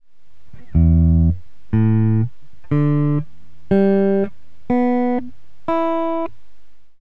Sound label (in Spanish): afinacion Estandar